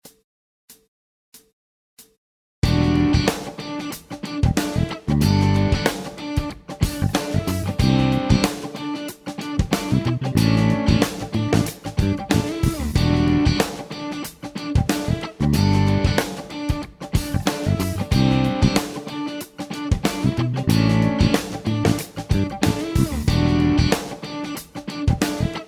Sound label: Funk Fusion